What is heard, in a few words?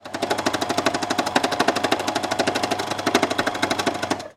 embellishing machine